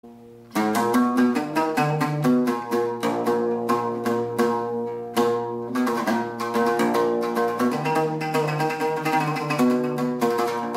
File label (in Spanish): Laúd